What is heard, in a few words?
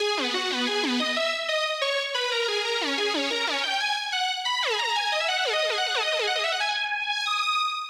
Intro Lead